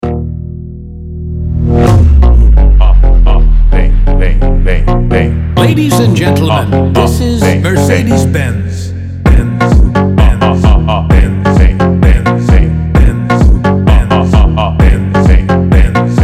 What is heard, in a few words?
funk